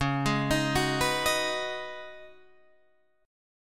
C sharp 7th Suspended 2nd Suspended 4th C sharp down Guitar DADGAD